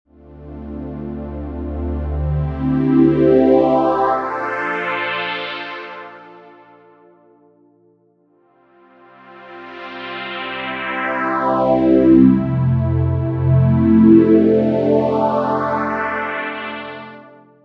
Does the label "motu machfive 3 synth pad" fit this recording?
Yes